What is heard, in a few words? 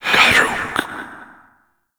Grimoire Fire Whispers; Warframe; Tenno